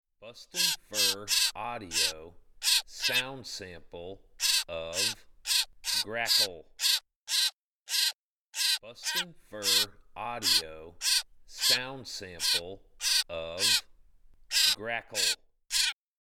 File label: Grackle